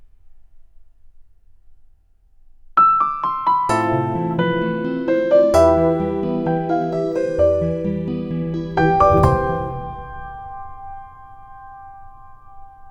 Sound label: Piano; DCAA Piano